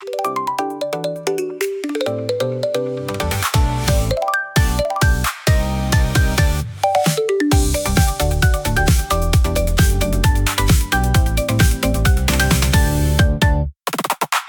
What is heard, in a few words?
loop